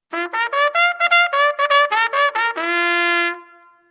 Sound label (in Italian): tromba miltare